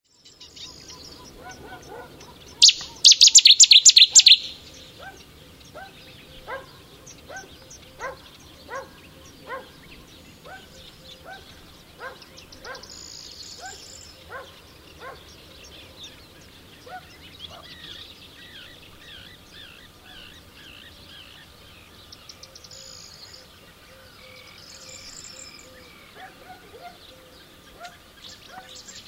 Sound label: cettis warbler; Rainham